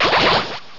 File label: Hydro Pump; pokerogue; battle anims